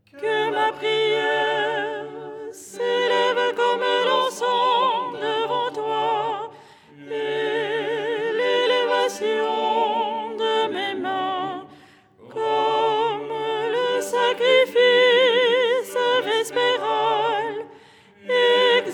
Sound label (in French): soprano; ton 3